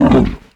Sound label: Monster Effort Big; Ralph